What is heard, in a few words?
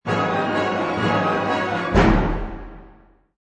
Orch Crec